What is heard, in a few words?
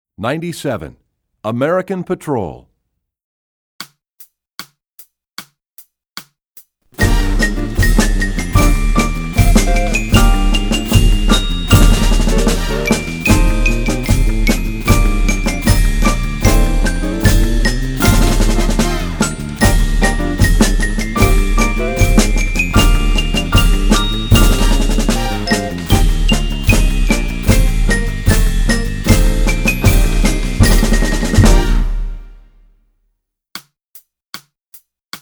Percussion; American Patrol; EE2000 Book2